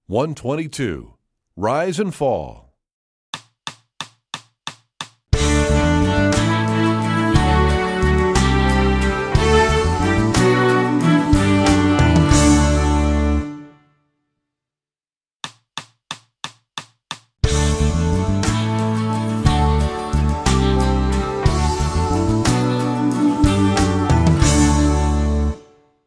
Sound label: Rise And Fall, strings; EE2000 Book2